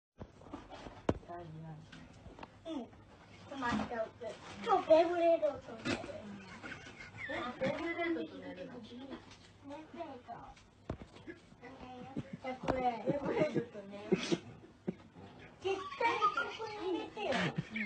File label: laugh; breadradio